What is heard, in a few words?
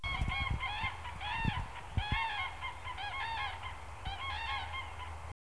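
European Crane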